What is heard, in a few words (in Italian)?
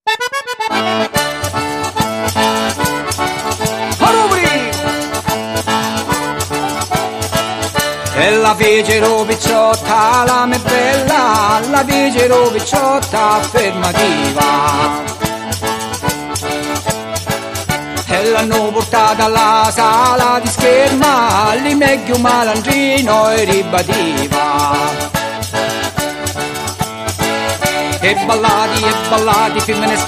Tarantella Calabrese